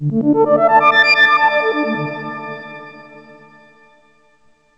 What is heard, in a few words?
ARP; Rubber Chicken Software Sample Bank